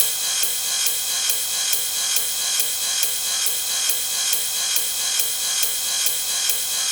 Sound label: NuTrance Kit 1, 138.5 BPM; Vengeance Samples